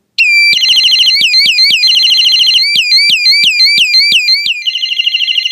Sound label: bear alarm